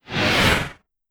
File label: fast air zoom